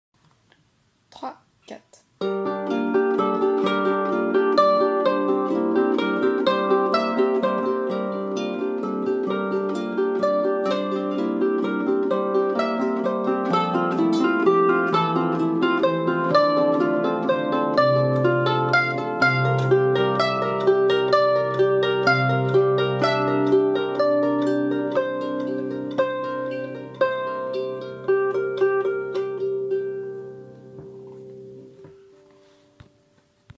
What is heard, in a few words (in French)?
partie C noire 112, harpe